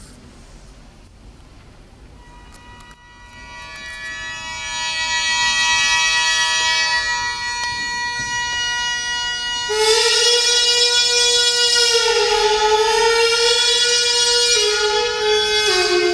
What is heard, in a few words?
Hyojo No Netori beginning, hichiriki